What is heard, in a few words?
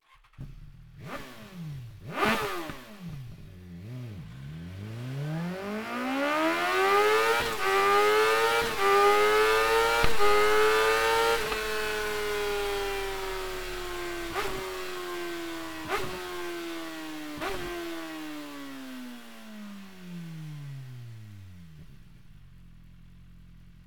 slip on